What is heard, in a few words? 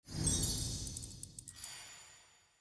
Progression Open